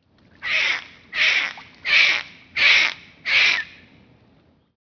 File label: pac ws dolph